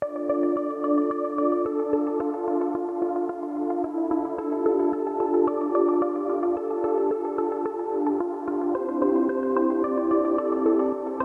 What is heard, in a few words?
electro dance